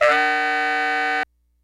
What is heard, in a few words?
HIPHOP HORNZ; Kid Nepro - Elite